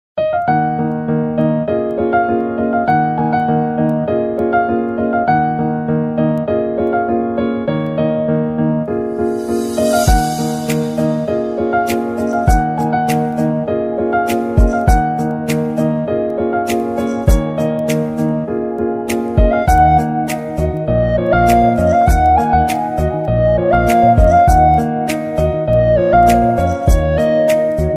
bgm ringtone tamil love ringtone

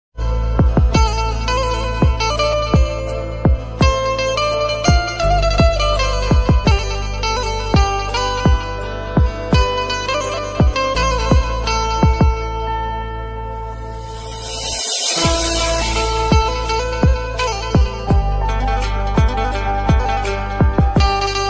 music instrumental ringtone love

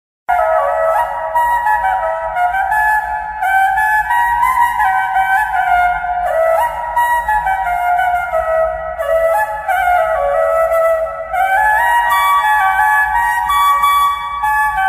flute bgm ringtone; deiva thirumagal

